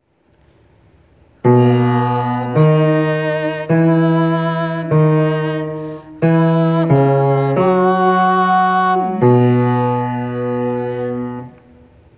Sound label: amen bass